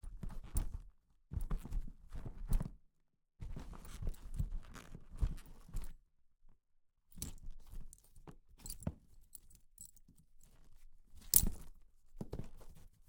1993 Suzuki VS 800 GL Intruder, Foley, Saddlebags open, close, handling, Shotgun mic; Cactuzz Sound